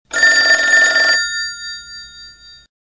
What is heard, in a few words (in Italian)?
squillo telefono campanello